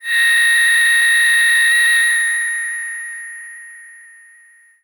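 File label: Breath V DX100 A#5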